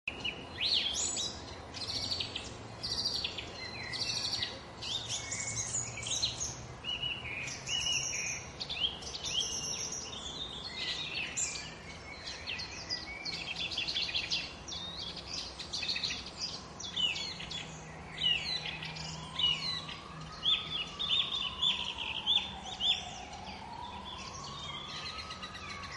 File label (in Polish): śpiewak; zakole wawerskie